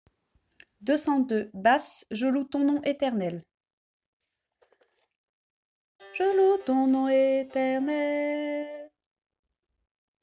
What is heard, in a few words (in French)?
Basse